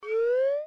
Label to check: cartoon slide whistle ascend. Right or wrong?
Right